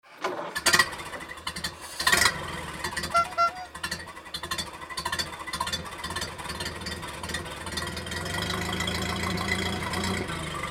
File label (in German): Oldtimer Ford Model A